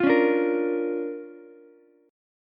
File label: D-Minor Major 7th Sharp 5th-D-down-Ukulele